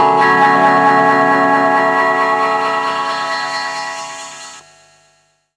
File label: Chase Chord, 11A; Jungle; Blu Mar Ten - Jungle Jungle - 1989 to 1999 Samplepack